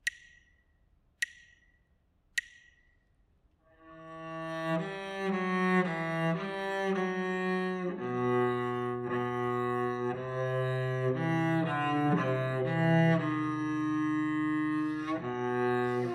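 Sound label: melodic dictation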